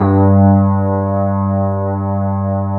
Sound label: FENDER G1; USB Soundscan vol.09; AKAI; Keyboards Old School